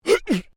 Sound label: Male Vocalization "Hun Cha"; Male vocal sounds; Exclamations; Voice